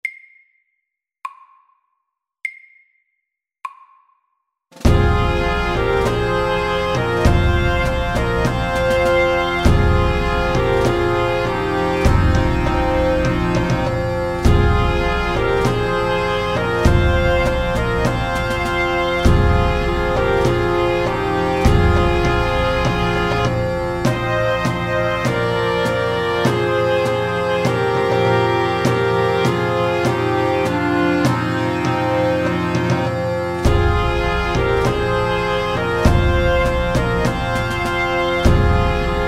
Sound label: Pipes and drums SLOW 100